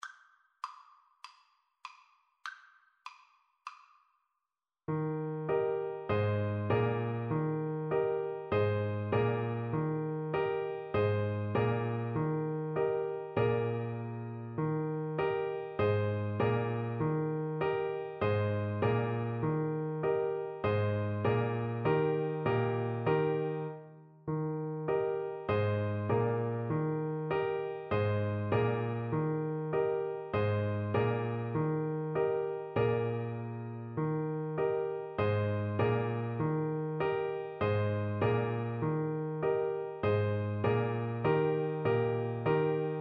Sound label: hornpipe, Clarinet